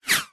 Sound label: bullet pass by; zapsplat